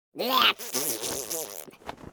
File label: crab Bad; reef raider